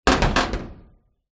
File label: impacts metal rod hit metal door; zapsplat